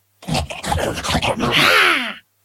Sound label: Hog Charge; Mother Witch